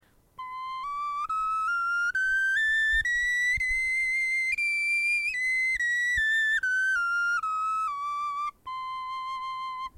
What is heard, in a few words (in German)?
tonleiter, knochenfloete